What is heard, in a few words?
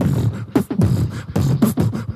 freestyling, Beatbox; Drum loops